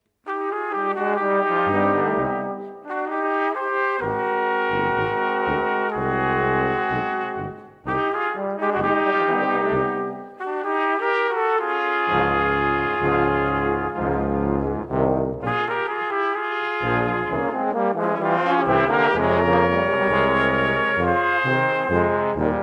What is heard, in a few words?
Tango